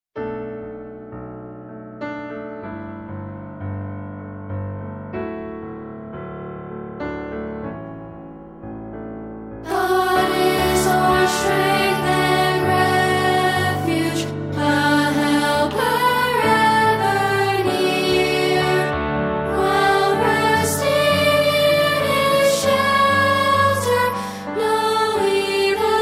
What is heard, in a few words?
band